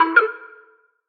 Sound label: Squelch Search Cell; Prison Architect